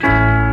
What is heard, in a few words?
turnsignal